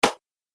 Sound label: Face Punch; Punch And Hit Sound Effects; combat